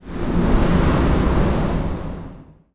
arid planet; ambient; Stellaris

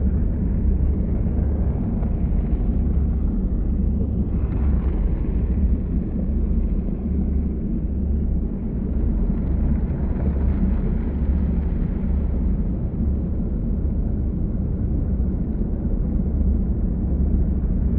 ship research station idle; aquatics

